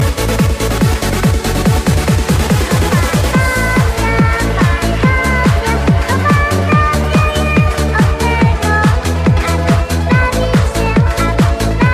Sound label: Disco